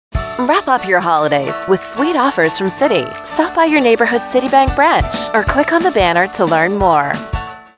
Music; Citibank

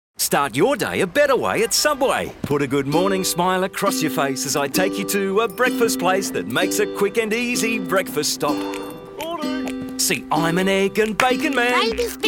singing

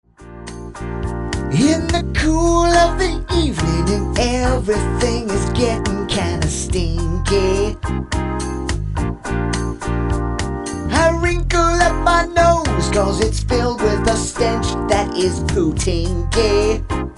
Music parody

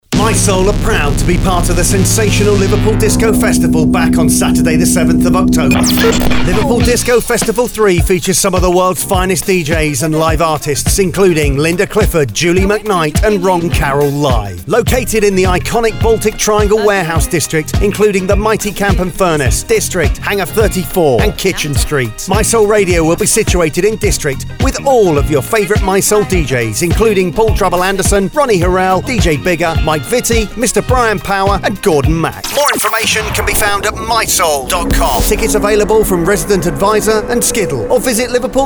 Disco; Mi-Soul

